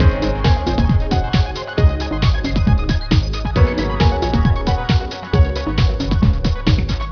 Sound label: trance